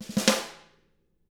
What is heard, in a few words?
snare ruff; ILIO; Double Platinum Drums 1; SNARE ROLLS